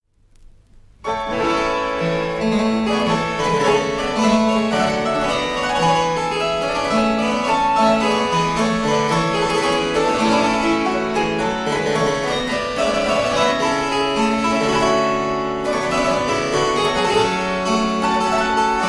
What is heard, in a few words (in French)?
Allemande a deux clavecins